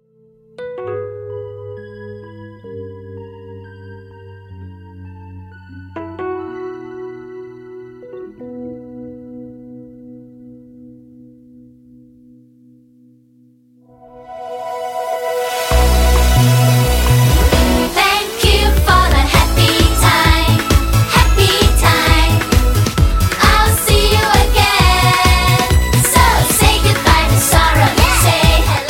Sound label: Wish You a Beautiful Day Karaoke